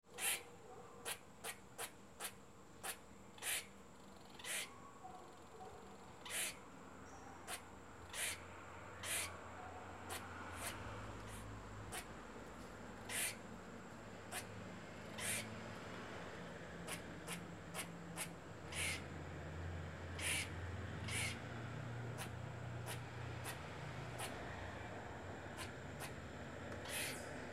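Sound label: voice squirrel; belka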